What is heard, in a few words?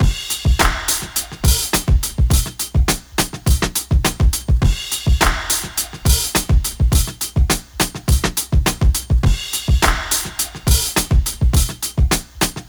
104 Splash Clap, Breaks; Lockdown Breaks